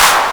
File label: Clap; Vengeance Samples; VEC1 Claps